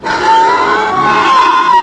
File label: bullsquid excited; hl2